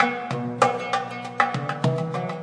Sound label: afroloop string and perc; WORLD